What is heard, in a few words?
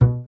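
string contrabass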